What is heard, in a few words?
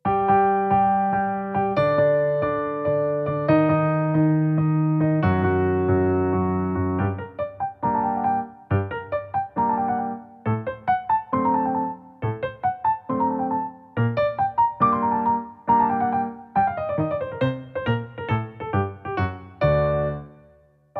Symphony, piano; No. 25; K. 183; Mozart